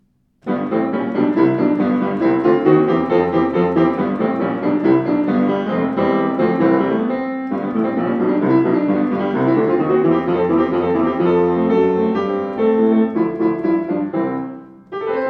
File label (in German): Grotrian Rag, Klavier; 116 grotrian steinweg carat schwarz